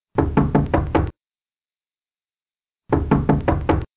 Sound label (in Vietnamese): Tieng go cua coc coc coc